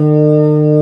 B3 E 1, ORGAN; VOCAL ORGAN; ORGAN #2

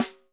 WOOD, Living Drums; 6X12

